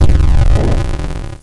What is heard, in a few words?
Explosion, Retro Game Weapons Sound Effects